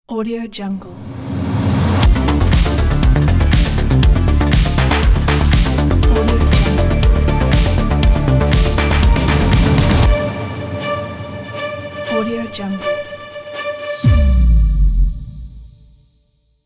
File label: combat logo